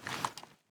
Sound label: aim out, weapons; STALKER; IWP